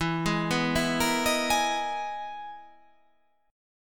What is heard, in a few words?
E-Major Sharp 11th E